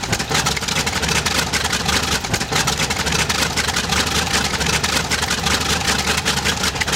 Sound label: Aircrafts Engines Jumo213A Exhaust Start; DCS